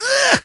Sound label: tnt guy die